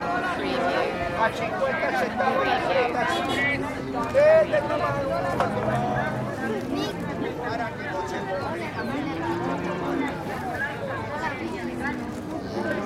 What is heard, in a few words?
animal market